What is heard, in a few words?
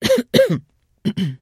trader clear throat; 商人杰恩（七日杀）